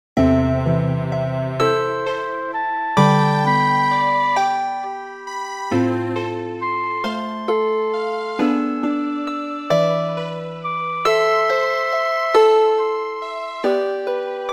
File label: greensleeves chords fractal tune